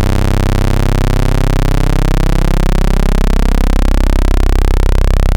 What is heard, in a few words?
Ultimate Bass Root C; Vengeance Samples